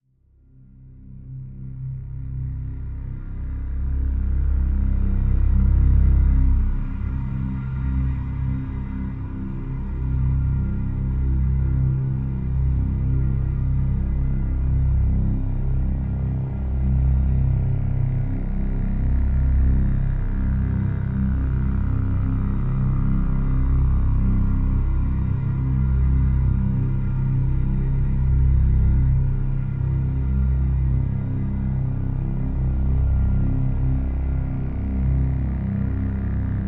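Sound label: Drone Reactor C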